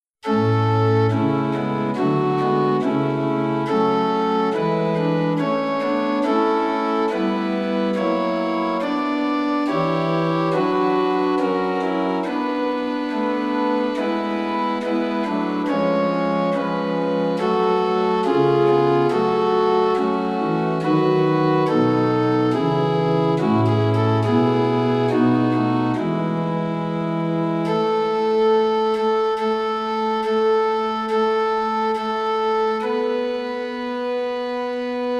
Instrumental

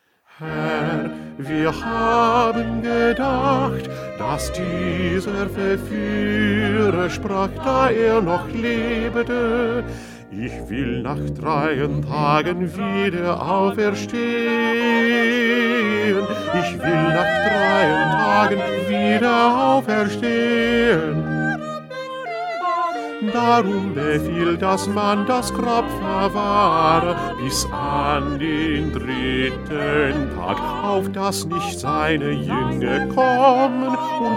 2nd Bass

